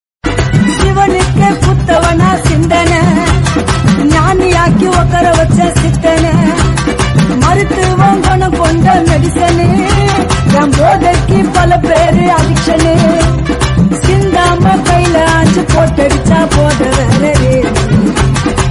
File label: tamil song